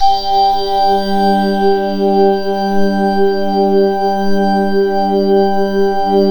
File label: G4 C-sound, choir; NorthStar - The Wizard VOL-2; C-SND CHOIR